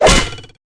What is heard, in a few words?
rake thwack; ea tsto; bob